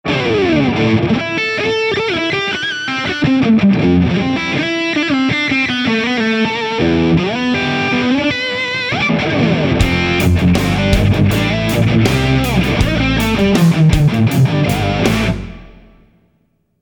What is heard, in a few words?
Jemmed, Celestion Classic Lead 80